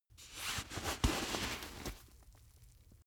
boxing gloves slip in; sport freizeit; boxen

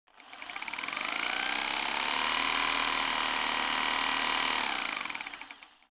rock drill chisel fast; maschinen; schrauben drehen hydraulik

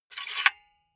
silicone gun empty pusher pull out fast; soundpool

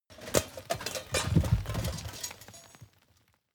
shrapnel and dirt fall

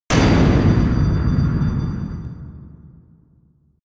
anvil shot; hits explosionen

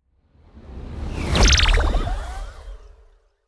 magic warp personal jump wobble; swooshes-swishes

